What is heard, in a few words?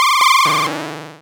Cry